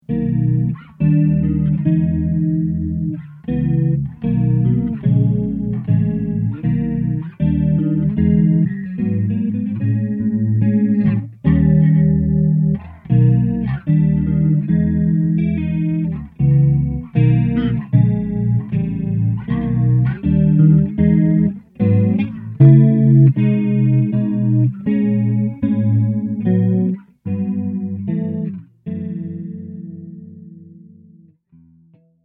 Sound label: Chorus verb